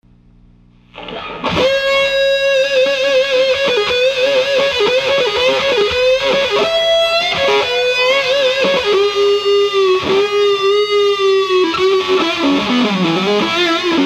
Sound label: fuzz and vibe, guitar